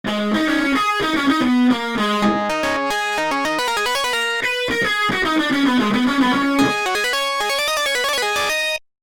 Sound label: dist organ